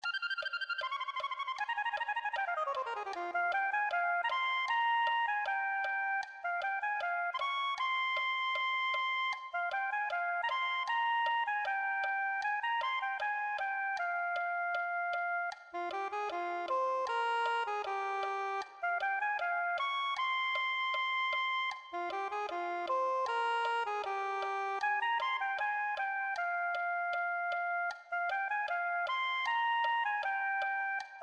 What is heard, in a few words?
soprano saxophone